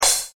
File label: crash